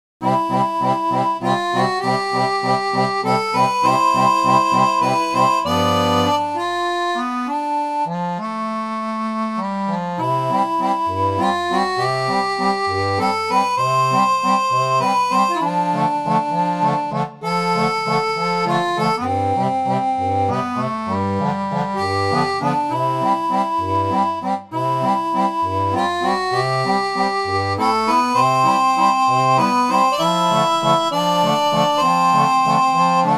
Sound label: seraphone